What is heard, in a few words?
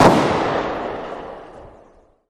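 tec9 distant, gun; arccw go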